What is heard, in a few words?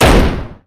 book slam; ga'ran